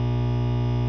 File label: hum; KATANA; Daikatana